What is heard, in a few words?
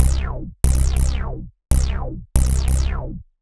ELECTRO BASS 140 A